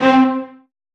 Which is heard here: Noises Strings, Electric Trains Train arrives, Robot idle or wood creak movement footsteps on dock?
Noises Strings